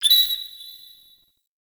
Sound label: Beach Night Bird; Warframe; Grineer Beach